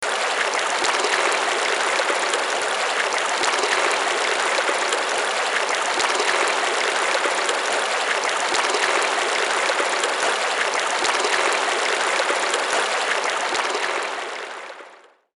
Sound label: Riverside Ambience; By The Waters Edge; The Legend of Mata Nui; Game Music; Console Games